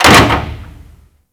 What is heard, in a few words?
Mover Metal Clunk; Ambience; Props; Warframe; Orokin Derelict